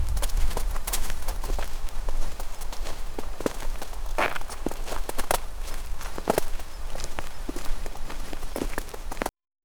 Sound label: soft nighttime forest amb, wolf